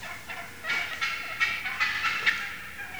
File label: flydown, turkey; hunting